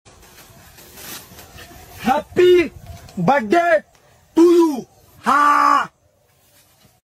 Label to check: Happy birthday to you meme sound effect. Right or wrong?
Right